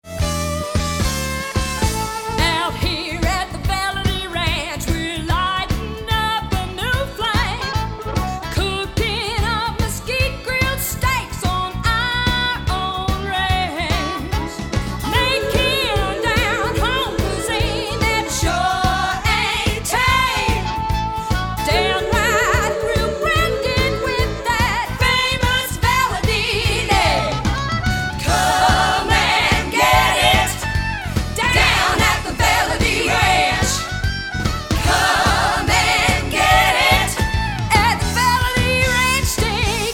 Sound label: Jingle; Valadi